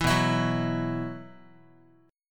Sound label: B Suspended 4th, Guitar; Bsus4 strummed; JGuitar